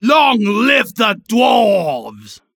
Bar Cheering when drinking

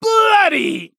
Arcade Game Lost Life

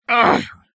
Walking in Sticky Goo

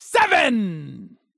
Slap Fuzzy Dice

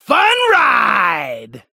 Grinding Pipes

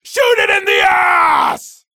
Reaction Mini Boss Roar

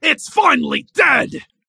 Generic Very Big Kill Cry

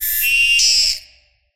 Mactera Grabber Scream

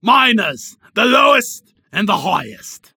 Bar Cheering when drinking

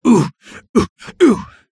Environment - Walking on Hot Rocks

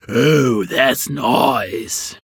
Bar Cheering When Somebody Orders Drinks

